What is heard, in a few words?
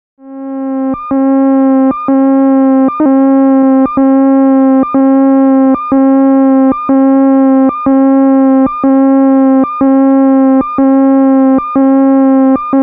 Bleeps Two Tone Two To